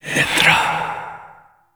Fire Whispers; Grimoire

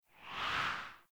Player Pre Landing Wind Gust; Falling

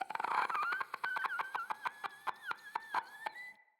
Infested Runner Patrol

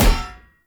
Sphere Polearm Medium Impact Armour Solid; Weapon Impacts; Player; Combat

